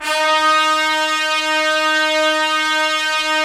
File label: Trumpets; Roland - LA Composer vol. 4